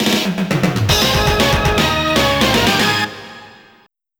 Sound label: Game Over; super sidekicks; Neo Geo CD